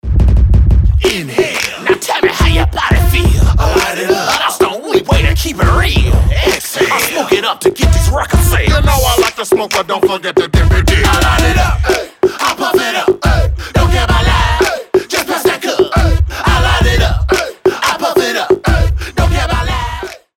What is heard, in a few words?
Light It Up Rap; Internet Session, Los Angeles